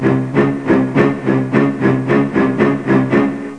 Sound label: jaws